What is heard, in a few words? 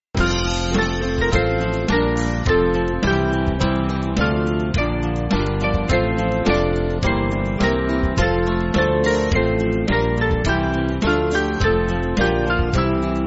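Music Backing Files for Churches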